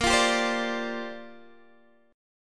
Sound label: Bb 6th Add 9th Bb Guitar Open G